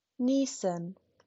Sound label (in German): niesen